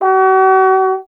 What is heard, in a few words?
Trombone F#0; Miroslav Vitous - Solo Instruments VOL-1; 90 s Sample CDs